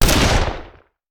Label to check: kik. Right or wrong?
Wrong